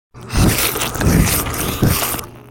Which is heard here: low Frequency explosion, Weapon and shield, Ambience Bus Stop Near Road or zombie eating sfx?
zombie eating sfx